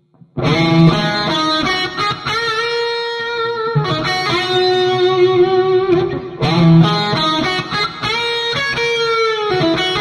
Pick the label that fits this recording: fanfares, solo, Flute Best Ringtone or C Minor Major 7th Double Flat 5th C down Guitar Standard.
solo